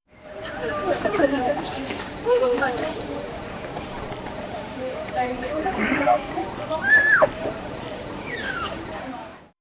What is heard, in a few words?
calm evening market sound